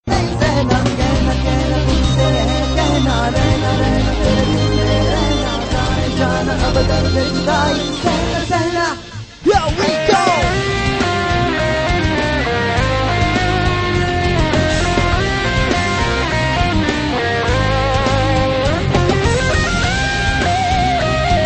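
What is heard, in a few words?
Guitar Rhythm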